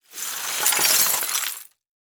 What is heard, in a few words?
Crash Glass And Wood Debris Sliding Crunch; Crashes